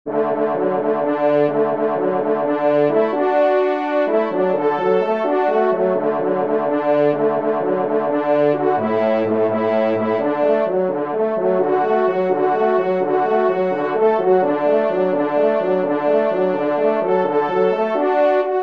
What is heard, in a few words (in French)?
Aux Accents du Cor, trompe; Victor Viney; 3e Trompe